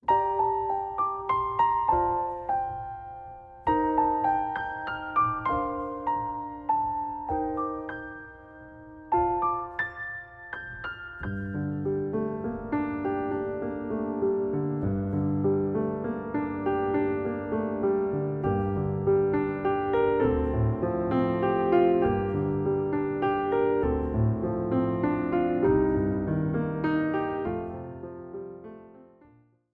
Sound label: (Accompaniment)